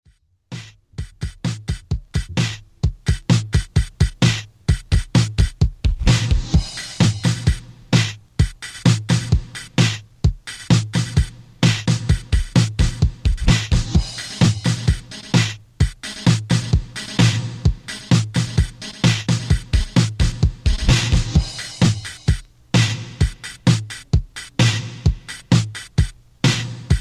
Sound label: Bonus Beat